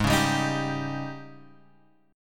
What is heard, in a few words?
Ab Major 7th Suspended 4th Ab Guitar Open G; 6,6,5,8,8,x; down